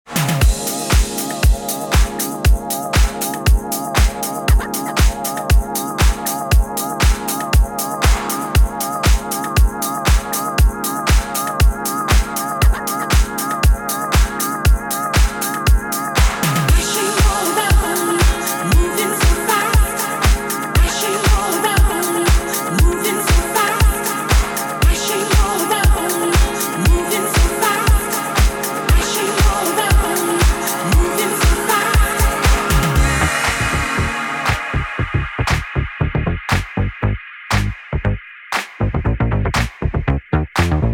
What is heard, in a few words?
Dubstrumental